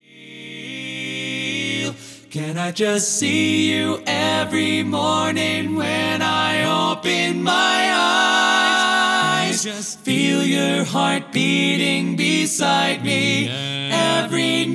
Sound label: Tenor